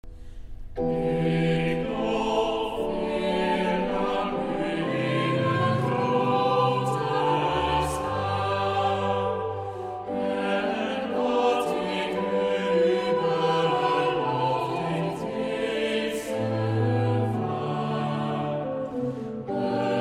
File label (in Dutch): Psalm vocaal